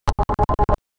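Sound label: hide in shadow rogue ability, stealth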